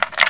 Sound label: crossbow bolt on